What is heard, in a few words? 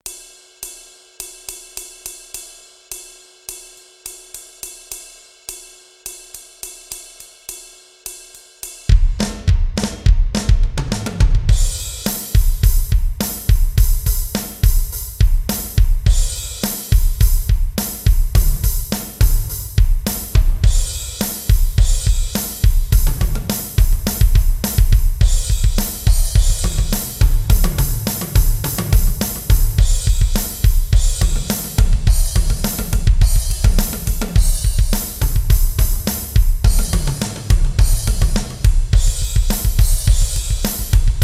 drums and percussion; brick and mortar; one living church